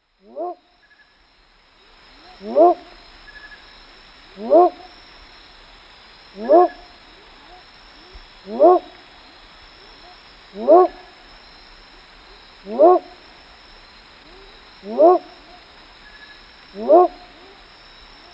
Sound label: leptodactylus myersi